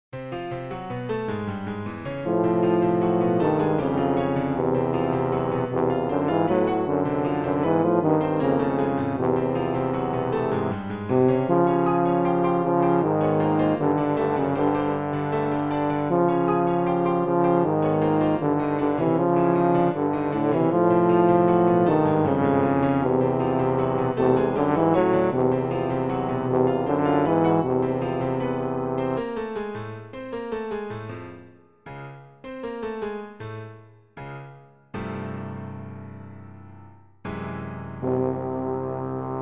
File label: Two Tuba Var